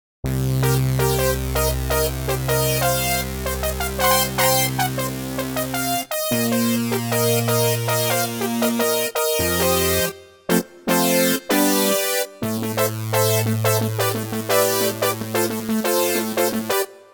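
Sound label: Brass Polysyn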